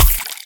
peashooter pea cannon

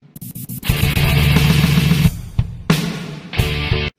Metal

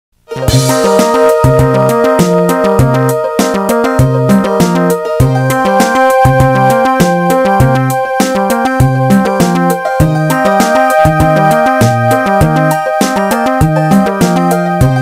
Music

